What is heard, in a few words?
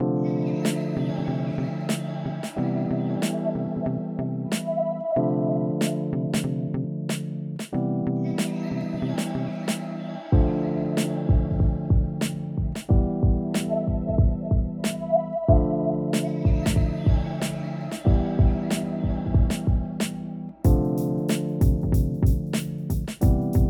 BEAT